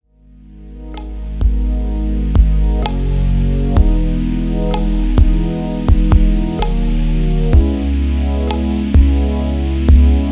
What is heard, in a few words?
1k Sine